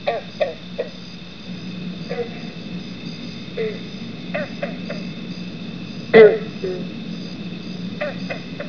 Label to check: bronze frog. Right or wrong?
Right